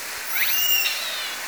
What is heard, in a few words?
call, Orca; SRKW; Alwin; S2